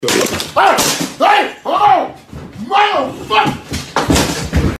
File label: Guy Eating Spaghetti With Scissors Gets Thrown a Fan